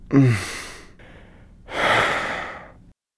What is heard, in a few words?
eat cake male